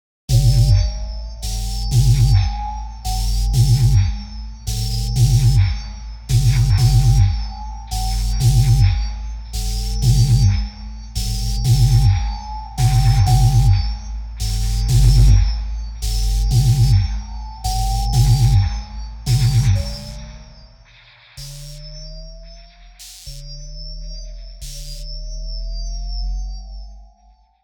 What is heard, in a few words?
Stunned